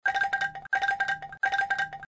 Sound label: Angklung